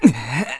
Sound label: Sword Charge; Link charging up a sword attack